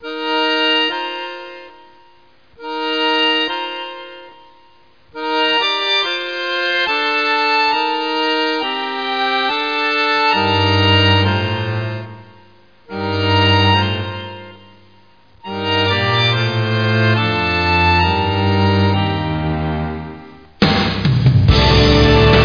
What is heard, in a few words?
theme